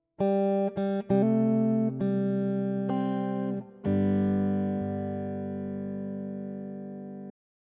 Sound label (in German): Reharmonisation Original; gitarrenunterricht